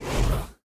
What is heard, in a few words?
flame; Mindustry